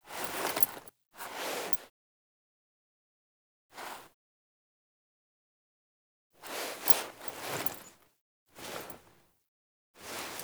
Foley Action Default Ancestor Acquire, Default Clothing; Characters; Player